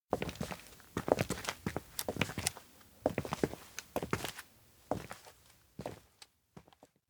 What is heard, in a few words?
steps outdoor road walk; awayuki; anemoi